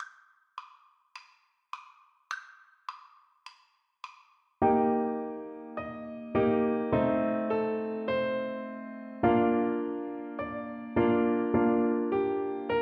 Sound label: Oboe; borodin polovtsian